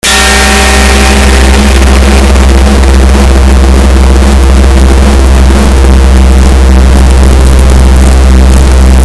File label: BELLS